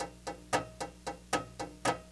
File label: ammo loop